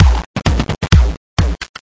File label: 130 bpm bitcrushed electronic beat